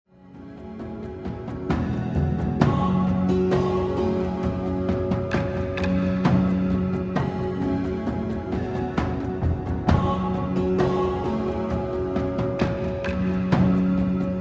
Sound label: Drums